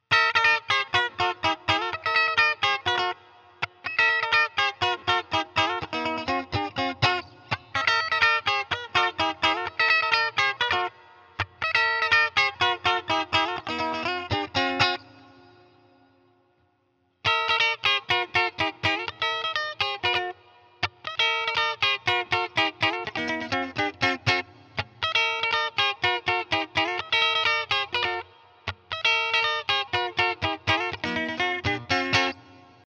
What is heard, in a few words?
S Premium Clean, ibanez s series guitars; s1070pbz premium